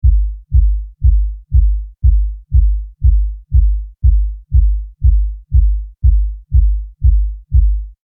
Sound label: musical drum bass through wall, Loud Music (Bass Drum) Through Wall; Bass Of Drums ( Music ) Heard Through Wall; Concerts (Sound Effects:Backgrounds); McKinney Sound